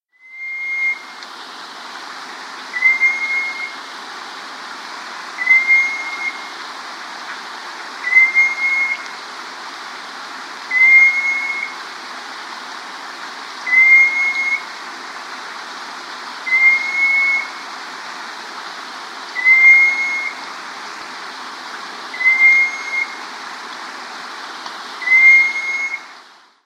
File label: Bicolored Antpitta; S. America